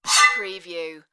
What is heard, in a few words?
Drawing Sword